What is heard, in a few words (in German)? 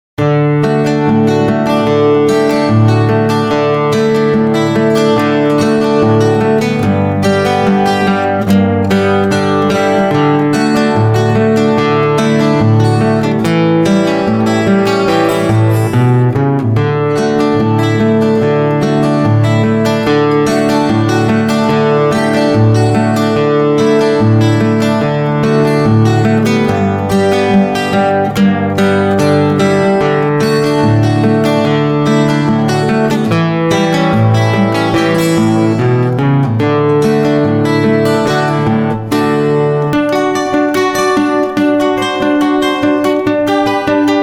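Gitarre Solo; blues; ragtime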